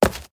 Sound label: Stone Run